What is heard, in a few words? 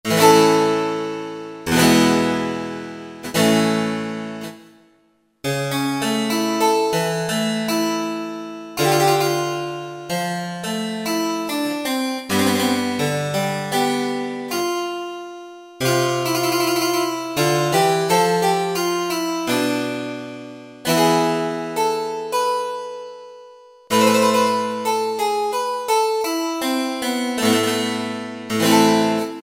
Harpsichord, Roland SR-JV80-02 Orchestral